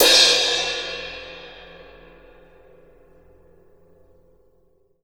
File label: ride